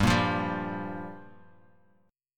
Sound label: G Minor 7th; chordshape; down